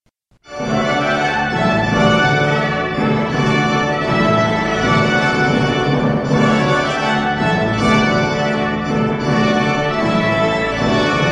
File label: Fanfare